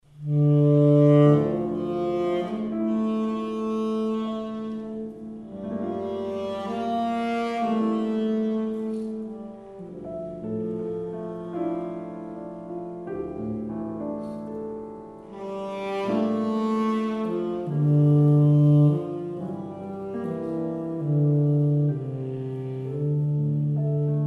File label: improv Christmas O Come O Come Emmanuel sax